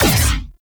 Gatling Laser Shot; Warframe; Railjack; RailJack Weapons

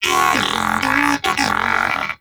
Moa Pet Tenacious Battle End; Pets; Warframe

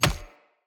Death Default; Enemy; Sinecure; Melee Grunt; Death Effects

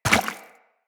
land goo, footsteps; Characters; Tenno

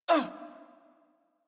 Generic Short Pain Operator Voice; Warframe; Operator Mission

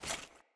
Foley Action Armour Run; Mother Armour

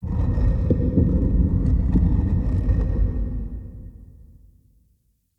Crypt Distant Stone Texture; Ambience; Champion Crypt

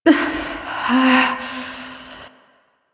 Fight Breath; Warframe; The New War; Cinematics; Throne Room

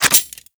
Scoop Idle Attach; Lunaro; Warframe; Missions; Tenno Ball

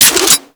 Twin Assault Rifle Reload; weapons; Warframe; Grineer

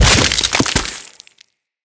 Uzi Explosion; Weapons; Infested

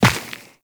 Run Dirt, Footsteps; Warframe; Tenno

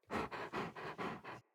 Tame Interact Sleep Pant Slow; Enemies; Kubrow

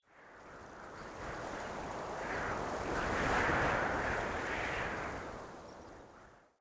Mountain Wind Gust Whistle Soft

